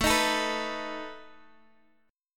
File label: A Suspended 2nd Flat 5th, Guitar, Half Step Down; Asus2b5